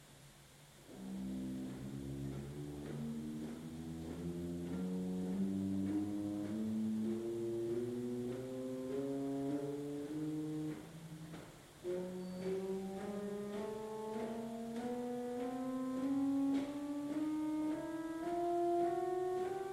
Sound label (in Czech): Manuál Dolce 8', Ukázka zvuku, varhan